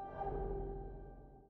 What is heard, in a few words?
better Swoosh; ludumdare32